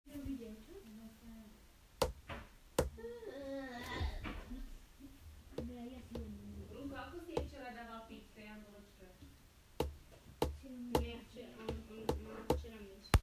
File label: block place; portalgun